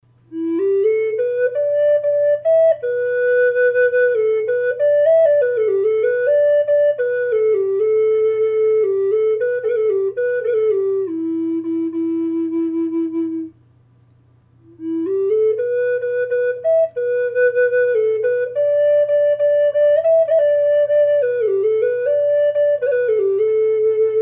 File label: hawk e minor flute; collector flutes